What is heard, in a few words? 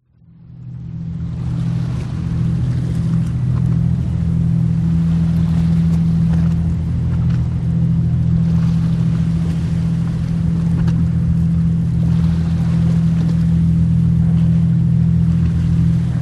industrial port cargo shipping park waves splash close distant drone hum machinery